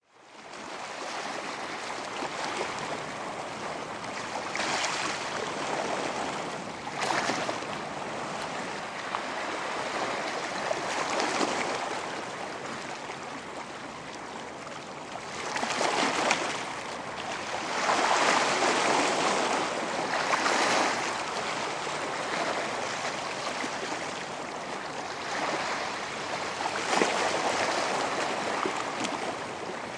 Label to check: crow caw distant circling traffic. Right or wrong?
Wrong